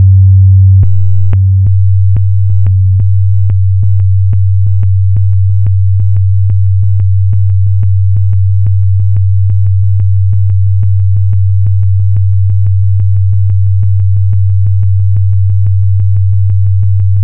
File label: Simple sine wave repeating